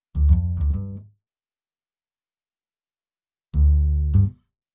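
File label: Double bass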